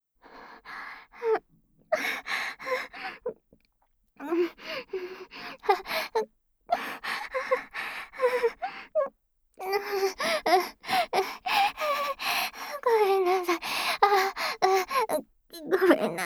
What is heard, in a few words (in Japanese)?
ごめんなさい連呼のお仕置きセックスループトラック, ASMR